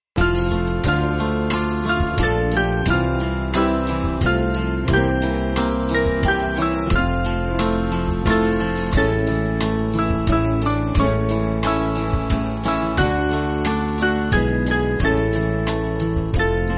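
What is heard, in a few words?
Band, Music Backing Files for Churches; Brighton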